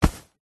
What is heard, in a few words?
fabric bag soft full of clothes grab; zapsplat